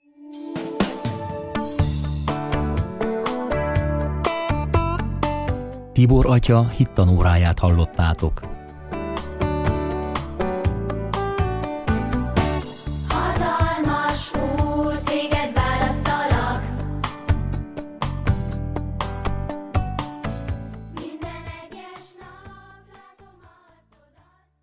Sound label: jingle; Engedjetek hozzam a gyerekeket